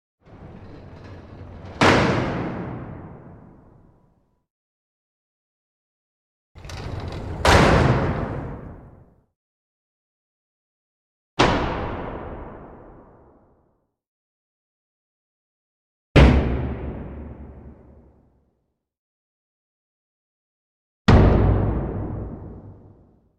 Metal Dungeon Doors Shut; Hollywood Edge Historical Series; European Myths & Legends

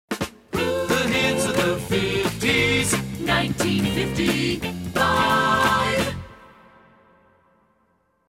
Hits, Drops

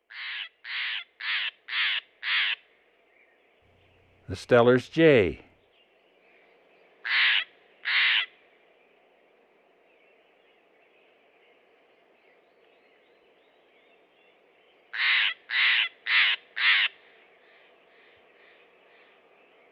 Steller's Jay; Western North America; Dan Gibson; Wildlife Identification By Sound

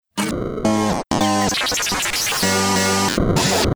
Snap Pellet; Best Of N2O Library; Breakers & Transitioners

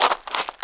GRAVEL, FOOTSTEPS; 1000fx

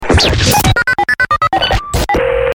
phone effects

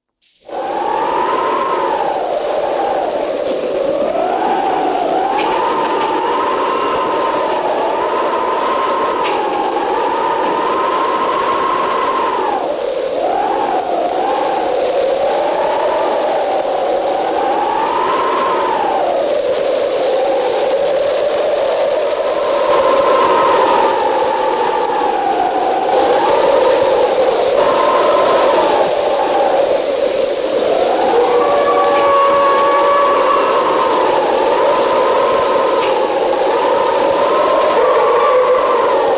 Thunder Lightning Rain; Disney

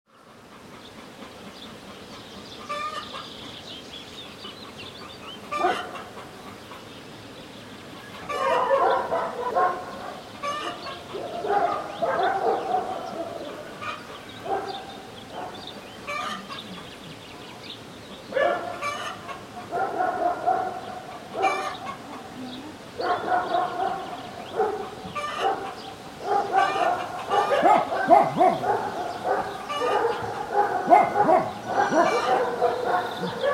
Village Morning Many Dogs; Hollywood Edge Historical Series; Traffic Free BG's & FX

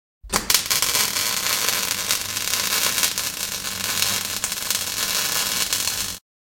Arc Welder; 100 sound effects

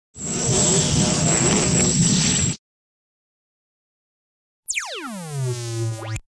Spaced Zapper; 100 sound effects

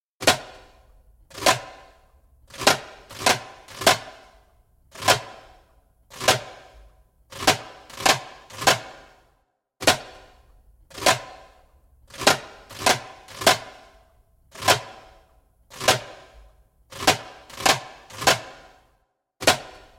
Clean Isolations (slow), Djembolale; Djembolale - Fills and Drills

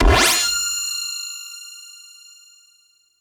SPACESHIP

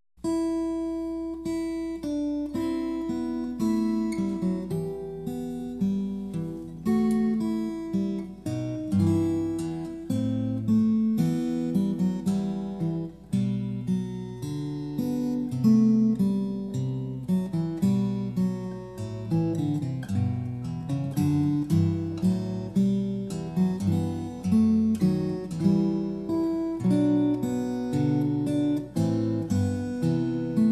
recercar, steel string guitar; The Siena Manuscript

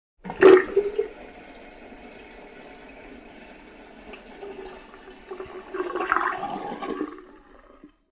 Water Going Down Drain, Household

